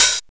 tamed open hat f sharp key, Royality free open hi hat sample tuned to the F# note. Loudest frequency: 6296H